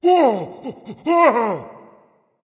fear, voice; smite; voicepack; ymir

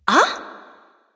surprise; smite; voicepack; skadi

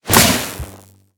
land; smite; raijin

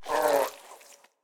hitreact small

